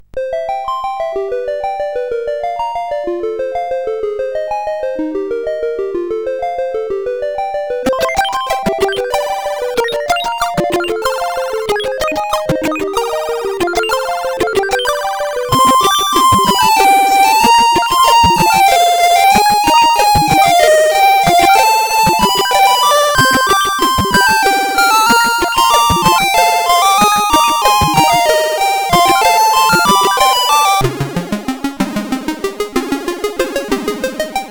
Medieval Tune, sid, CSG8580R5

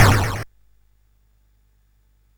Dark Castle, CSG8580R5

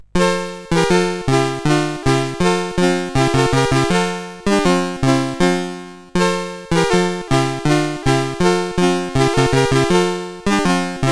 Deck the Halls CSG8580R5; Master Composer

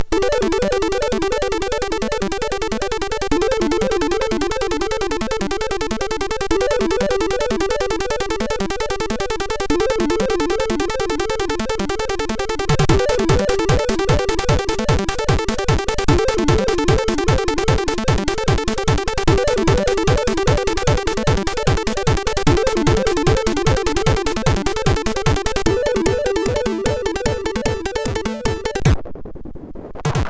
Detect Failure, MOS6581R4

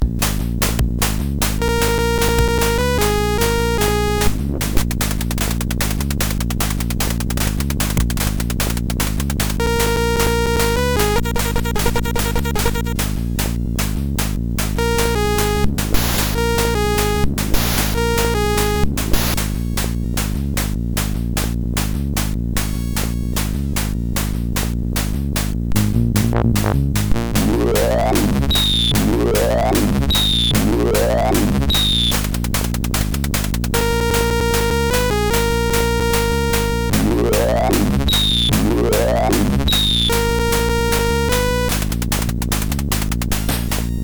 Techno Mix, sid MOS6581R4; hvsc

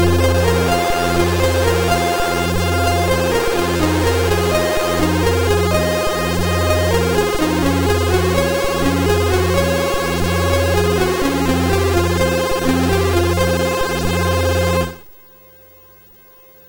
Metall Glimmer, MOS6581R2

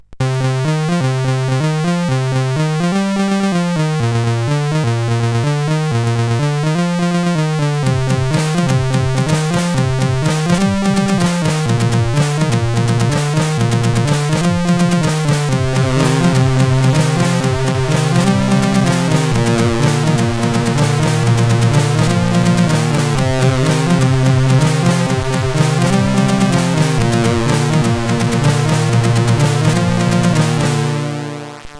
sid; CSG8580R5

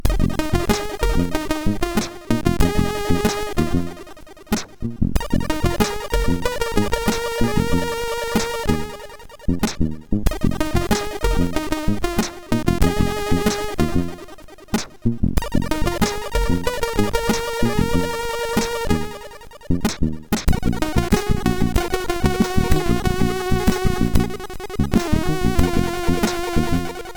G Funk, MOS6581R4

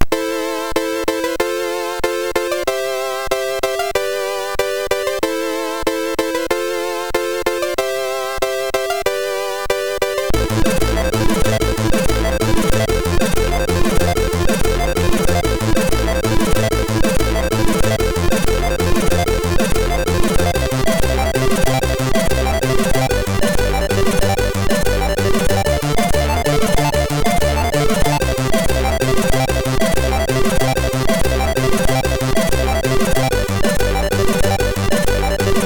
Old Style, MOS6581R3; hvsc; Froyd

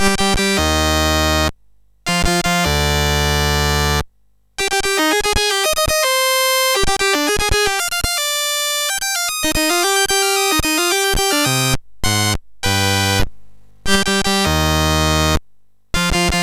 Beethoven Sinfonia No 5 BASIC, CSG8580R5

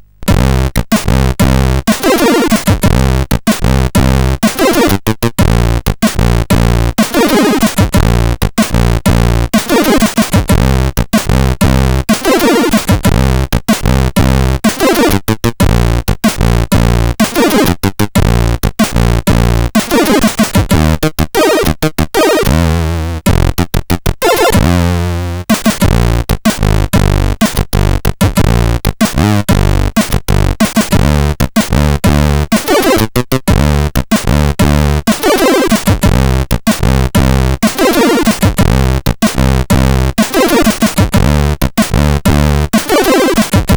Power sid CSG8580R5

